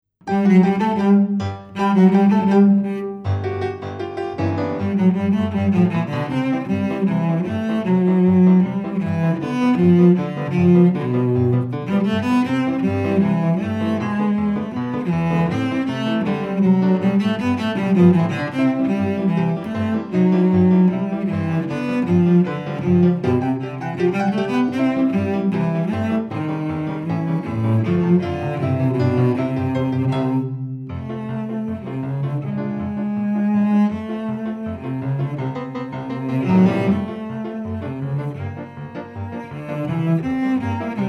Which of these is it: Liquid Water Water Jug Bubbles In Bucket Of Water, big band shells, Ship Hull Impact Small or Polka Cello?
Polka Cello